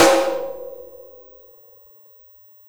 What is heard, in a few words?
Snare Shard; ZeroGravity Drum Shards